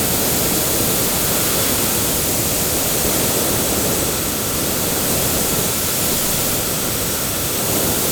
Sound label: tools leafblower loop; teardown; ps5 windows xbox series xs